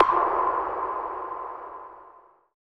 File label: Steam Ping; Zero-G Smoke